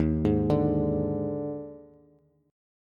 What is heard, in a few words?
D sharp Major 7th Suspended 4th, D sharp, down, Bass; arpeggiated; D#M7sus4; JGuitar